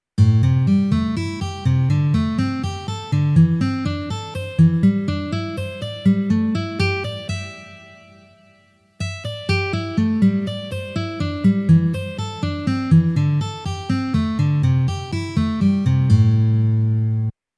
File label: Am penta 3 string, guitar